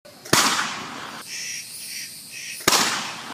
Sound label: Bird Gun; Blueberry Farm